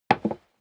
step leather shoes wood creak single stair down

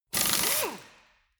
impact wrench screw single short; industrie wirtschaft; handwerk

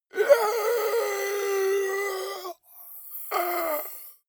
monster inhale; kreaturen

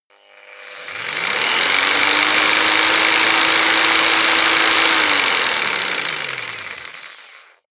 jigsaw engine medium slow long

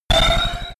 sci-fi laser shot hard; krieg-waffen; soundpool

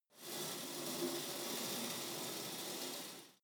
pullup machine cable release soft; sport freizeit; fitnessstudio

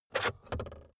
car backseat table pull out cupholder

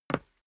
step boots wood parquet slide short

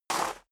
step high heels gravel medium slide short; schritte; Footsteps; menschen

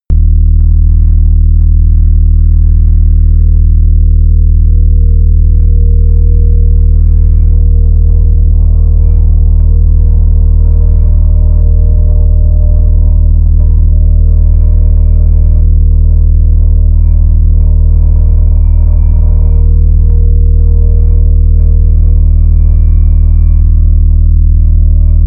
drone bass

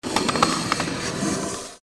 scratch; kratzen

